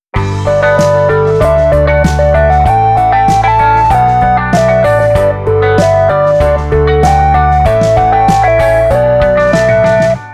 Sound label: Arpeggio